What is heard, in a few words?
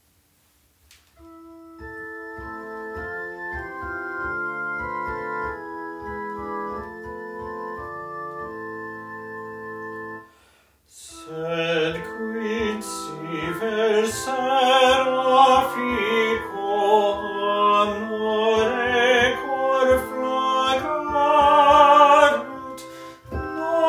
tenor, cor jesu